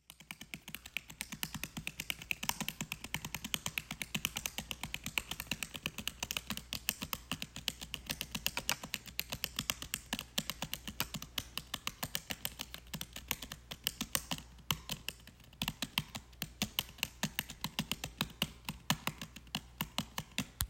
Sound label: Typing